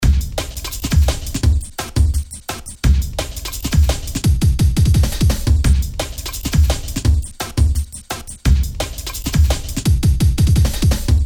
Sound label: ze drums